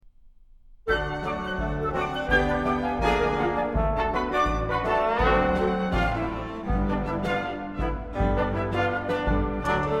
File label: Ragtime Orchestra